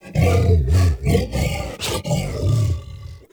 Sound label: Juggernaut Truffle Found; Warframe; Infested; Truffle Hunt